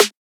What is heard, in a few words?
Snare